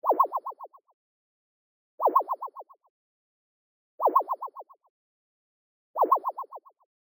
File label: Comedic, 90s Anime; Doex Studio; 90s Anime SFX Pack